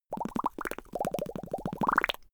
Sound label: bubbling lips